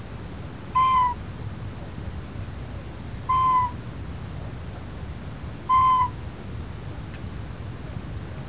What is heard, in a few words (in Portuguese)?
macuco